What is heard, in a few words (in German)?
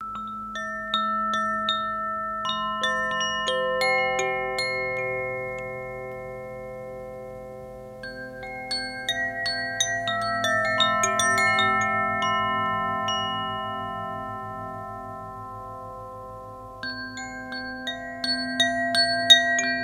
klangspiel koshi terra